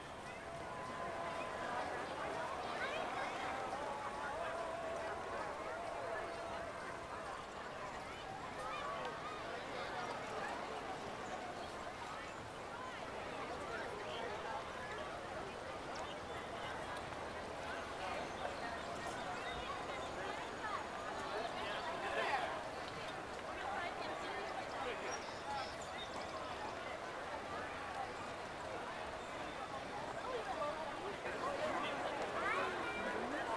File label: City Ancient Large Bed, Ambient; Civ4 warlords